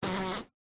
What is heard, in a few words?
insects fly trapped short wing buzz; zapsplat